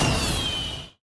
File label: tone; Fourth; seasonal